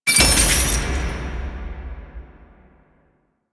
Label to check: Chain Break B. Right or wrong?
Right